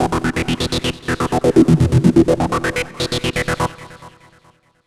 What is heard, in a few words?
Reso Bass; Basses; Dr. Fink's Funk Factory